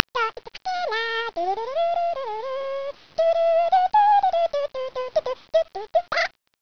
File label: chicken singing